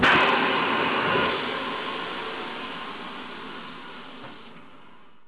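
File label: Emergency brake, train; LT1972 MkII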